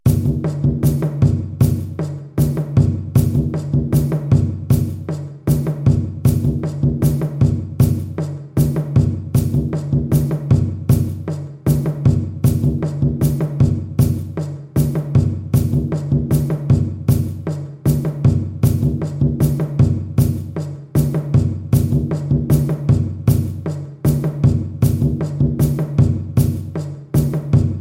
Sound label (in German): Stammesrhythmen